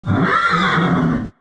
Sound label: horse attack; fauna